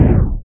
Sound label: low off, jetpack; cuboid